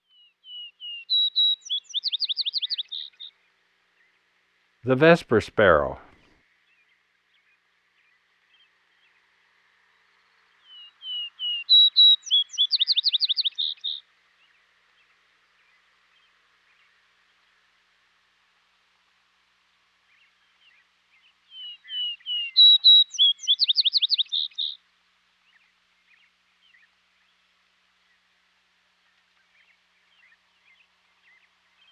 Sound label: Vesper Sparrow; Western North America; Dan Gibson; Wildlife Identification By Sound